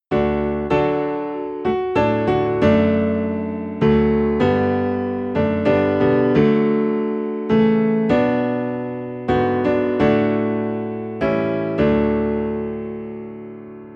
Piano, Low in D; Amazing Grace

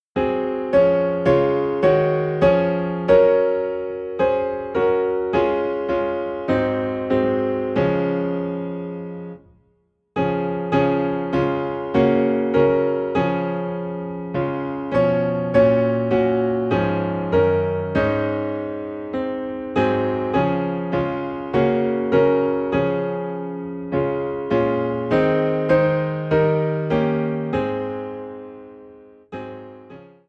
Piano in D Flat; Lead On O King Eternal

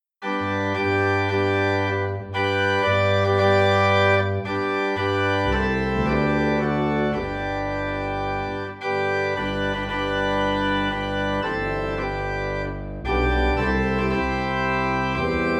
Organ in G; Victory In Jesus

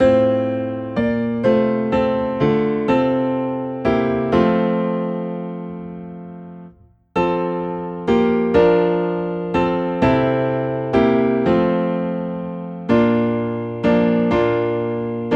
Piano in F

